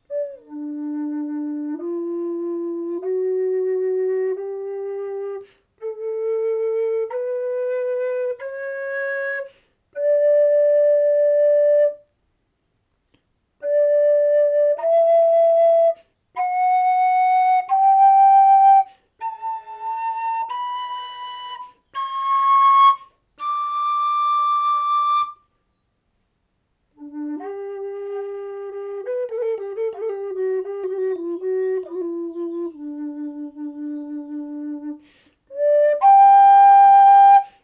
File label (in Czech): Pastýřská píšťala D dur